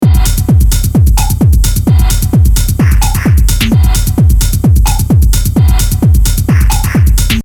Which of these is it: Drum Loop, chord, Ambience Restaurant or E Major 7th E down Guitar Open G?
Drum Loop